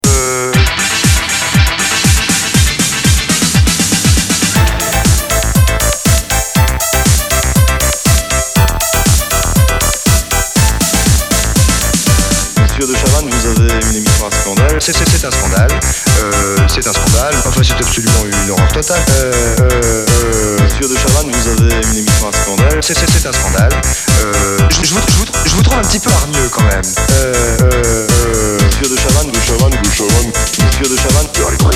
big radio sound

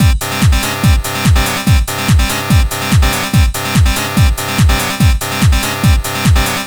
rave clavi

